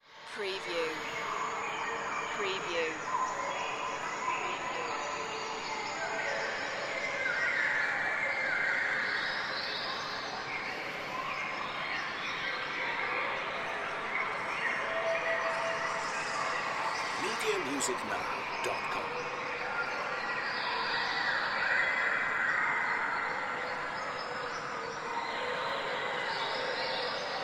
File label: Enchanted Forest; sound-effects-bible